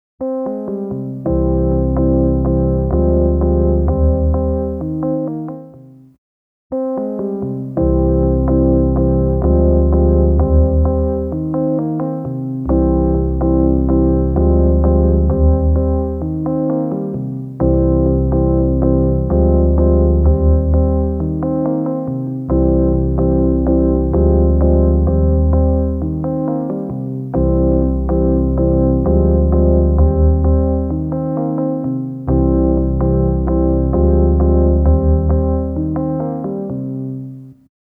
Lexicon Modell 200 Hall Synth

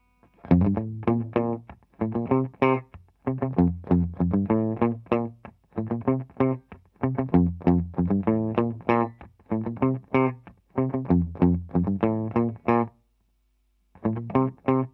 Clean Single Note Riff

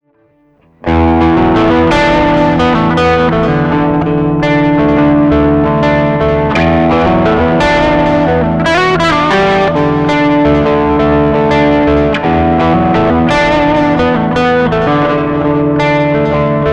Crunch

